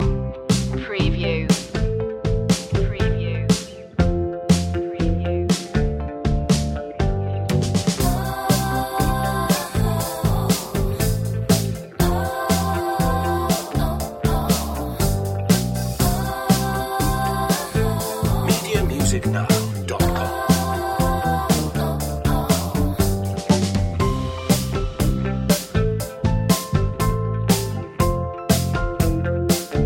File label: joyful pop